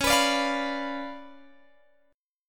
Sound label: C sharp 7th Flat 9th Guitar Open G, chord